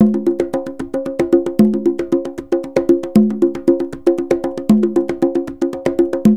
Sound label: drm loop